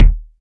short bass drum single shot D# key, Royality free kick sound tuned to the D# note